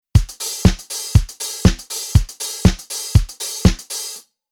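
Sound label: Rhythm Disco pattern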